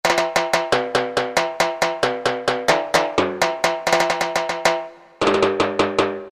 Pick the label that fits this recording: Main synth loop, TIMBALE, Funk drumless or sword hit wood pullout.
TIMBALE